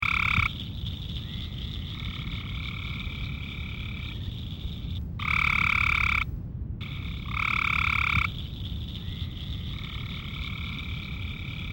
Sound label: Copes Gray Treefrog